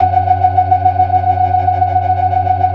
FAST VIB, ORGAN, TREMOLO VIB

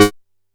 SHORT G#3; Boom da Bass 4; E-MU Producer Series Vol. 6 – Analogia Project

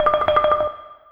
XILO; USB Soundscan vol.60; AKAI

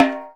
Soprano Drum; Planet Earth; Digital Sound Factory Planet Earth

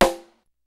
kicks snare, kid; Kid Nepro; Elite; KICKS SNARES

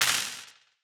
Wider Clap; stereo toolkit

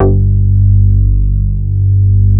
MOOG BASS G2; Best Service Dream Experience

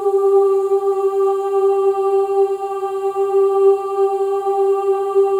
OO G4, WOMEN; Spectrasonics; Symphony of Voices; WOMEN OOS

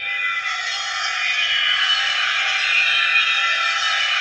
GHOSTS; Pro-Rec - Synthsonic

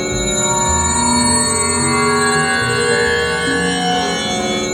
A#3, TURBOBELLS; Northstar; The Wizard VOL-1

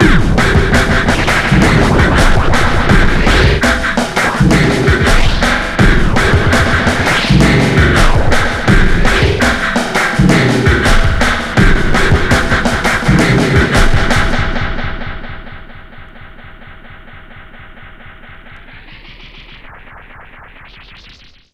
WEIRD BEAT, 83 bpm, Breaks; POTBreaks

